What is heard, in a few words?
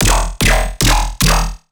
Bass Shot E, Dubstep Basses; One-Shots; Squiddim; Ghosthack Advent Calendar 2021 - Day 16 - Dubstep Basses